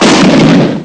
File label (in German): auto ramm; ST-XX Amiga Soundtracker Sample Packs